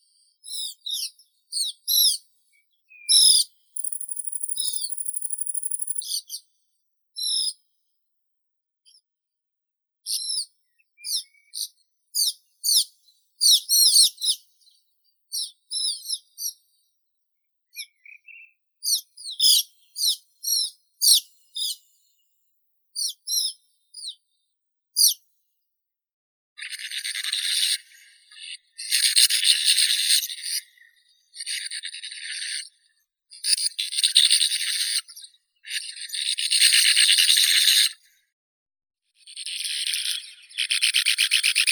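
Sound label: sand martin, Riparia riparia; lastochka beregovushka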